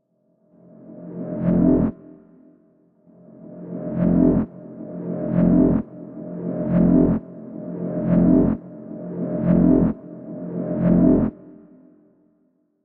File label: alien underworld sound